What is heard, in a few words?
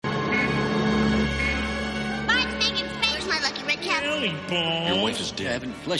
nervous grumble; marge simpson